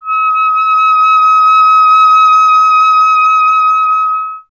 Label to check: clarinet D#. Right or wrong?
Right